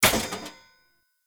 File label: Short Sword Throw Medium Impact Metal; Player